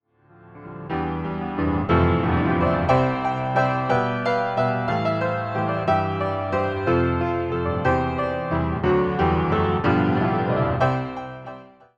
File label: PIANO